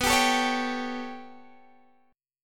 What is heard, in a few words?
B 7th Suspended 2nd Sharp 5th B down Guitar Open G